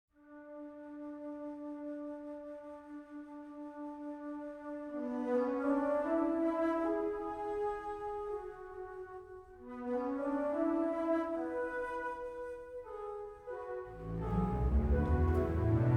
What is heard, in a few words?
World Music